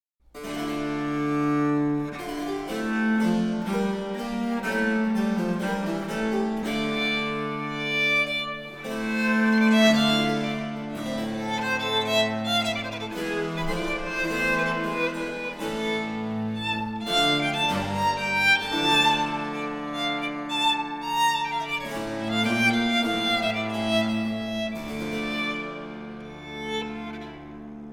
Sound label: Sonata Seconda a sopran solo